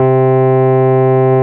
RHODES; Best Service Advanced Media Trax Modern Composer

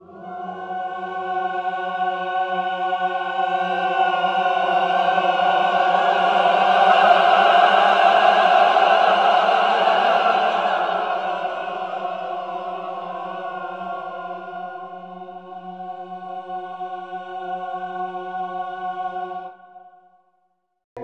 CHO VIBRA, CHO Vibrato; Sound & Vision; Gigapack I CD 2; CHO Vibr.+NoVoic

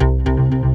SFX, FILM SCORE; AKAI S6000 CD-ROM - Volume 1

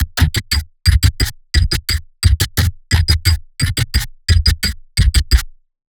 glitch loop for artcore

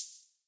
HH, Dance Drums; Best Service; Dance Mega Drums; HH HOU

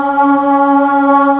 BOYS C#3, BOY C; MEL BOYS

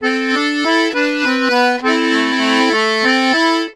Complete Accordions; USB Soundscan vol.40; AKAI

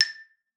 A5, XYLOPHONE; Xsample VOL-4

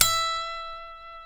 F#, funk guitars

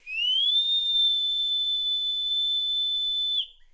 WHISTL; ILIO - Ethno Techno

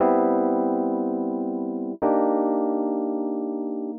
120 Rhodes G, Keys, Nu Jazz House; PowerFX

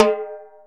timbale; EMU Planet-Earth

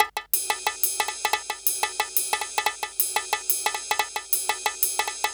Conga Loop; Sonic Foundry (Sony Creative Software) - Fluid Dynamics Drum & Bass; Construction Kit; Percussion

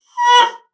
BASSOON; Xsample VOL-6

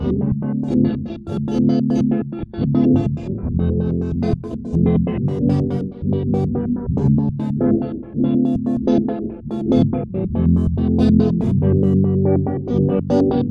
Guitar; Eclectic Electronica

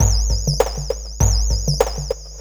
drloop, drumloops, Vinyl Attack; Masterbits

